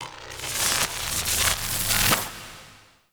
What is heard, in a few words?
Sniper Shot Charge; Powers; Hunter